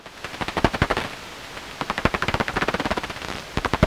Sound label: Daubentons bat; Jules Agate